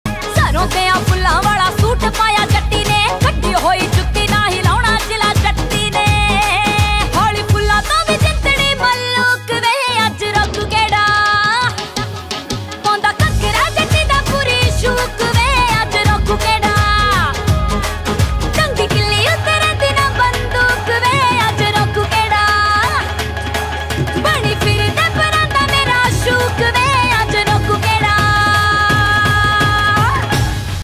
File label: punjabi ringtone